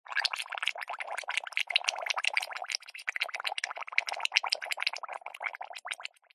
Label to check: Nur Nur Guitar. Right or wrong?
Wrong